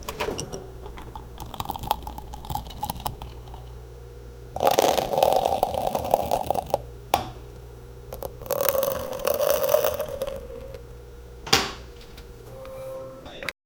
a cup of coffee spilling; everyday objects

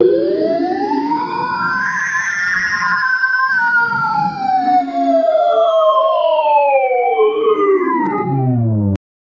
create a sound of a, race car

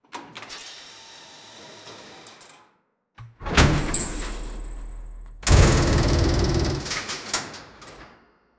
hydraulic latches disenga, latch

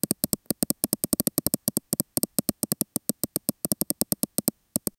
touch screen tapping soun; digital

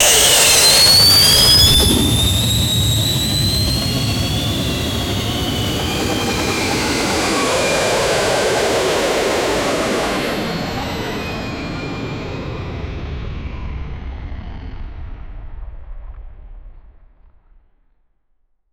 futuristic mech thrusters; blastoff

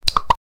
poison effect rpg; mystical

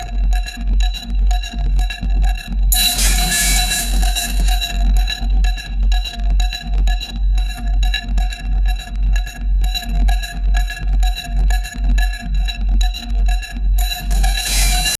dark and creepy very fast, techno

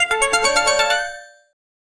harp bloom love

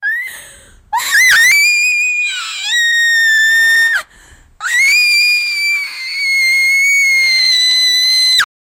a teenage girl screaming, scary

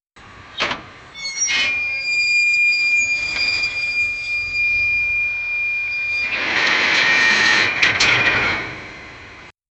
a portcullis metal gate; mechanism

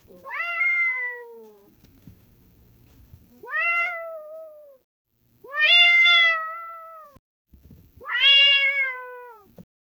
cat skittering claws on t

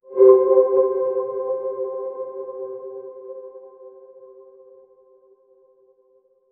appear the magic

